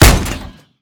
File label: Coilgun Medium, Weapons; Visual Variety Pack Modified